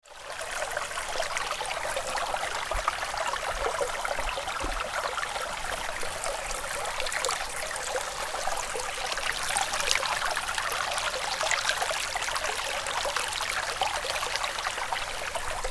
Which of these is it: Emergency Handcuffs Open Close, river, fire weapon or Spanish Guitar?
river